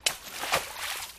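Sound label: Footsteps Splash Stream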